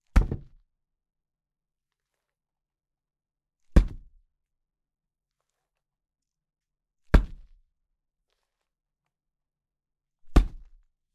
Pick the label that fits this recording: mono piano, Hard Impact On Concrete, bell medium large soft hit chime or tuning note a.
Hard Impact On Concrete